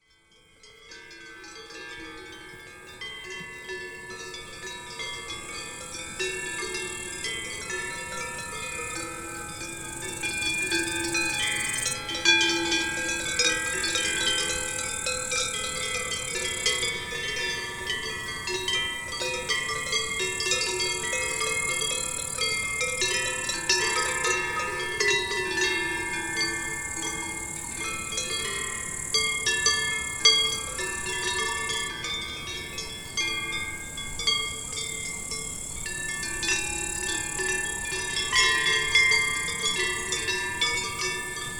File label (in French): alpage beaufortain